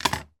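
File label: impact wood bamboo cane drop on wooden surface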